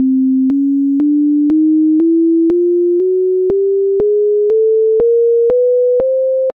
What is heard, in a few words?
aliasing sine